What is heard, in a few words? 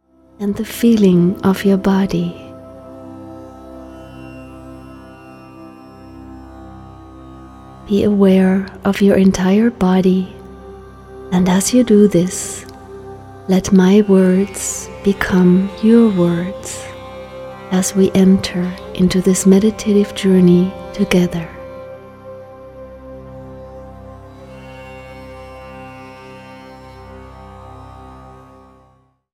Meditation; WellBeing